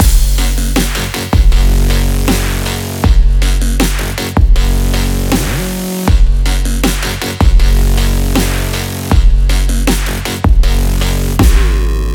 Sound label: sport action music loop